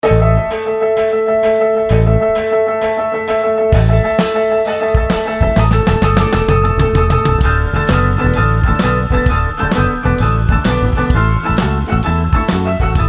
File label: BLUES